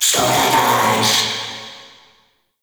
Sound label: Summon Vocal Verb; Warframe; Uriel; Vythelas